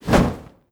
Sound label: torch attack lit; Rust